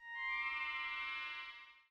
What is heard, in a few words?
Sparkle; Thinkin' Science; EdMark